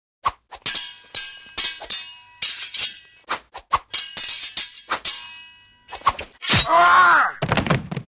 Fight with swords of two people, one stabs, screams, falls; cold weapons